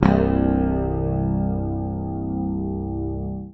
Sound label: PICK, Bass Library; Sampleheads; Will Lee Bass Library